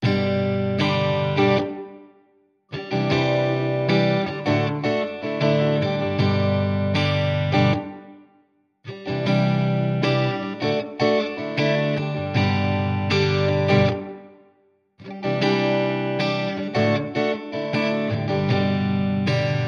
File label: Simple Days Of Joy, No Drums